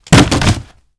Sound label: BF On Chairs Comp; Fairlight Sound Lib